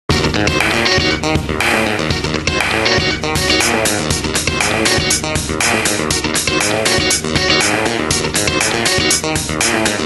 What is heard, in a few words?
Ring